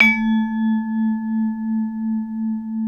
VIBE, ORCH PERC

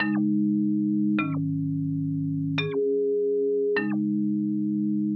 Hip Hop and R&B Drumloops

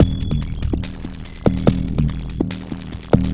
HOLOGRAPHIC BACKBEAT; FRENETIC FRACTAL GROOVES

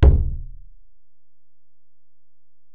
Ac BD, Alesis DM-PRO; 300 Drum Machines

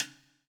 POWER RIM

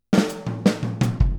Boogaloo Intro Full Mix, Drums, 82 BPM

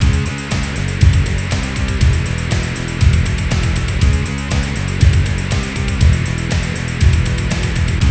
FAT ROCK; Best Service Advanced Media Trax Building Blocks; FAT ROCKA

